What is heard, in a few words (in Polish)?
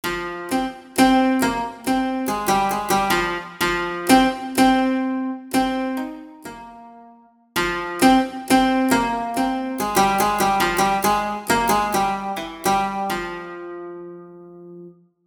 Monochord